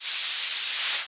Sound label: paint tool; DanisRace